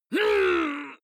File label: male fight pain groan; comedy-cartoon; soundpool